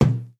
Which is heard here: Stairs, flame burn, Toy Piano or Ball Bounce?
Ball Bounce